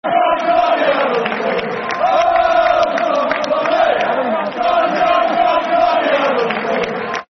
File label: Football Chants (Soccer Song); Tom Huddlestone Song; Tottenham Hotspur Thfc